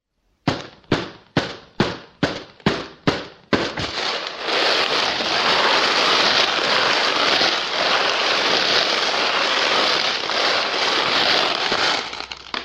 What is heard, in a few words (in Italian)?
grandinata